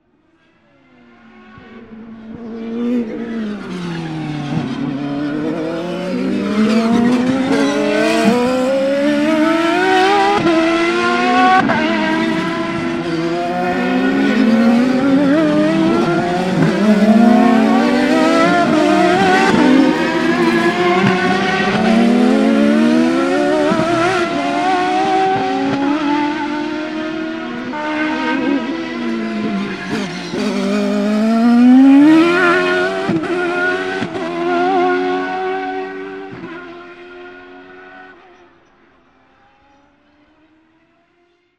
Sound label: CARS